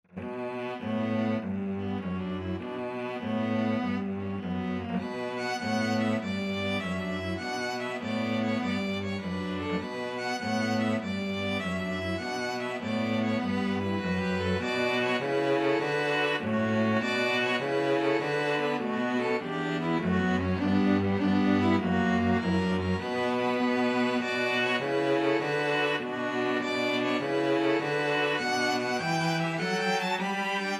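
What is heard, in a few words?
string quartet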